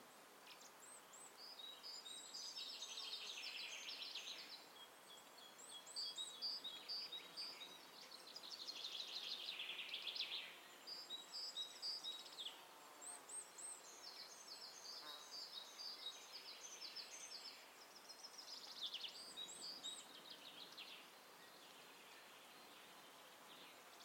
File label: nature day, ambience